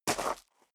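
step dress boots gravel medium single right, footsteps; schritte; menschen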